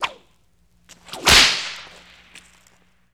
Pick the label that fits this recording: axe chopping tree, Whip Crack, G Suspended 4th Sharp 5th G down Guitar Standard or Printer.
Whip Crack